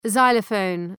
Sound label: xylophone